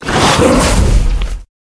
Rat

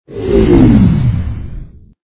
charge vampir

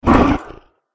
dragon warrior wound

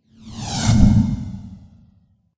tsar scorpion

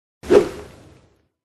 bat dodge

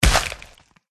dog attack contact mid

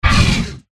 hydra wound

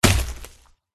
orc skeleton attack contact up

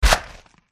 dog demon attack contact bottom

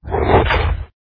stonedog bite

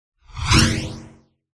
dragon blue buff in

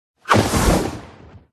cat attack

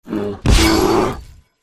Bear

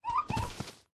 Pet Raccoon Die

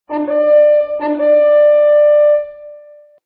victory; fightover

